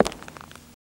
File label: FS Male On Hardwood, singles wood